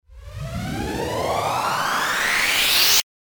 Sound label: RISER